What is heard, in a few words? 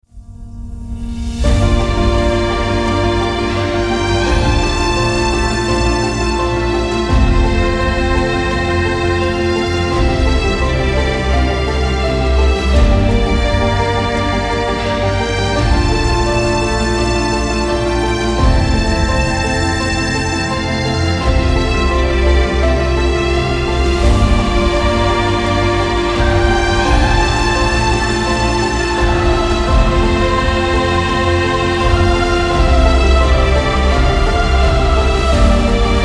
Sentimental piano